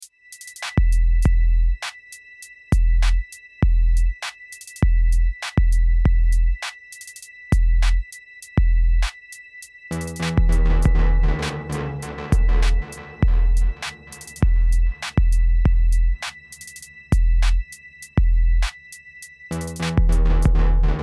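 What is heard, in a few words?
100BPM, Loops und Beats; THW; arrangiert: THW 100BPM plus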